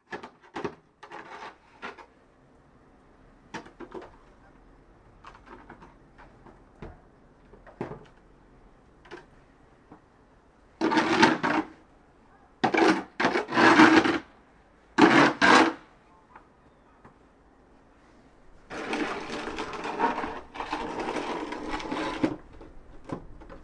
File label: Shoveling and scraping